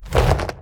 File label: wing small; smite; god cthulhu